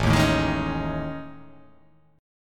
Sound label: A Major 7th Flat 5th, down